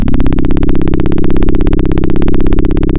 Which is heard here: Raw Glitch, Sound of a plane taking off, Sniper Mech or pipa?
Sound of a plane taking off